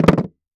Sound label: Skateboard Flip On Wood; Movements; Sports Movements